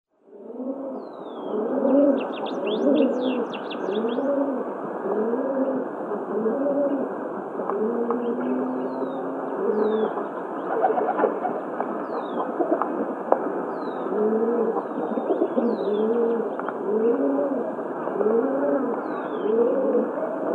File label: Rock Pigeon